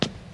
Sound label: stairs step; Golden Crayon Sounds